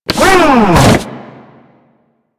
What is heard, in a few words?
Elevator Door Close; Elevators; Clan Dojo